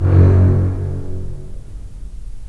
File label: STR, Full Short; Roland - LA Composer vol. 4